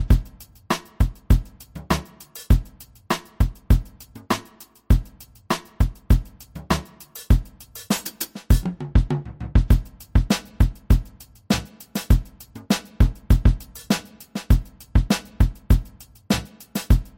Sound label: acoustic drum set